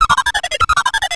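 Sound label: droid probe battle; Star Wars Knights Of The Old Republic I; XBOX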